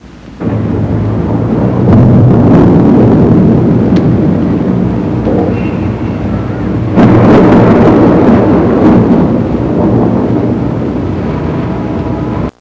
thunder in the distance, Nature; Sound Library 2000; Wayzata Technology

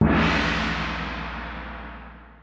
Ventana Sound

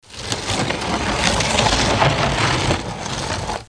CATAPULT, CITYLIFE STREET

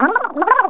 drown; sfx; Universo Animado

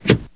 draw gun; Hellboy

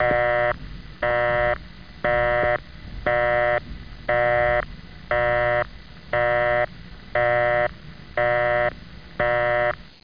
busy

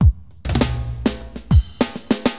DM LOOP, HipHop, Drums

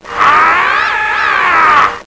new game; Tetricycle

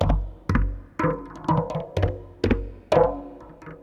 PERC LOOP ETHNO 125; eJay

